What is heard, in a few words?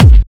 Kick; live-beatbox